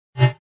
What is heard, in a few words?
beam; SCI Games E3 2005